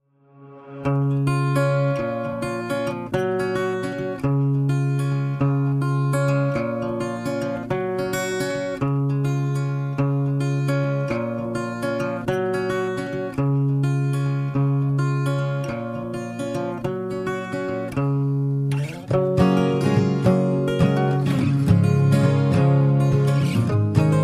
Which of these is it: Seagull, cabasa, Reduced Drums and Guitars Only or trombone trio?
Reduced Drums and Guitars Only